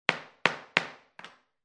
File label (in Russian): molotochek obuvshhika